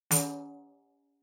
Tambourine (Rim Open)

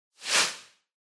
Noise Sweep Dry

